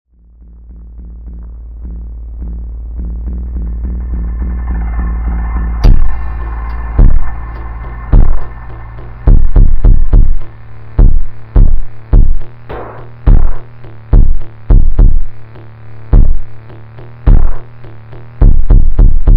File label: Brazilian Phonk slowed Drift Funk Music Remix